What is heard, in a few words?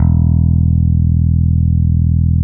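PEAKS BASS E3; PEAKS BS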